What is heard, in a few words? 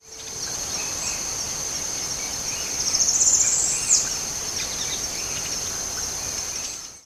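Parula pitiayumi, Laguna Diario, Uruguay; UY; Silveira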